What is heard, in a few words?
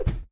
hit, snowman boss; monsters